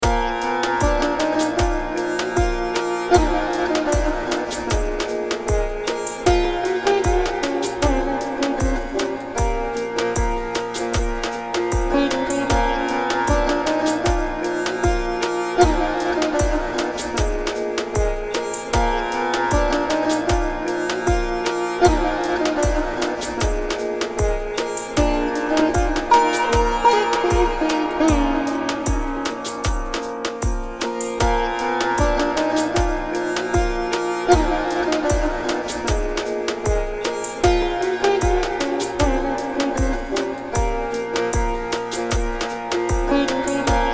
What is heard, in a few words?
indian oriental tune